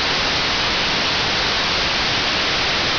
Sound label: static; svencoop; keenhalloween